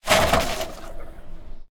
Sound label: cub break hard engine stop; anemoi